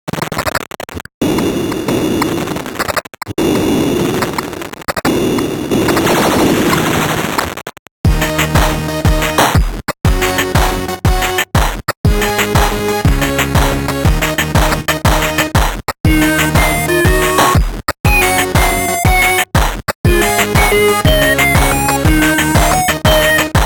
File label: missile